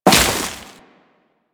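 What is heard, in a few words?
Crewman Step Vegetation, Footsteps; Warframe; Corpus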